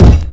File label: barricade; nzp